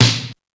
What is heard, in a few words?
SD3 M1, DRUMS; East West; Global Sounds